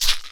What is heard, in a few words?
box rattle; Kenya Box Rattle